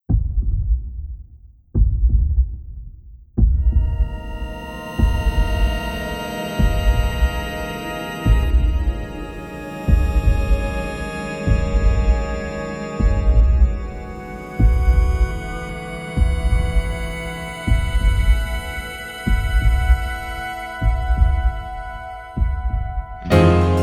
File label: Instrumental version; Kara no Shoujo